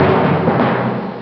wirbel; ST-XX Amiga Soundtracker Sample Packs

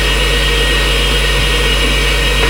HEUL; Sounds Good - Methods of Mayhem

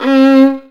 GEIGE; Best Service; Alpine Volksmusik

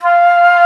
FLÖTE SOFT F, Alpine Volksmusik; FLOETE; Best Service

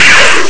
schrei; Amiga Soundtracker Sample Packs

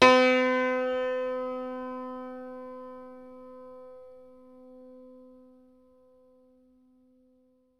HACKBRETT C 2; Best Service; World Colours

